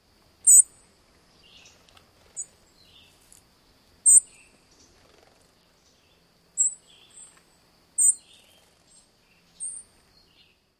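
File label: seep calls, Song Sparrow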